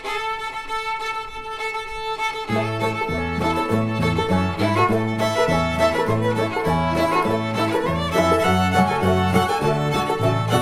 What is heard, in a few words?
Stringband; Cherokee Shuffle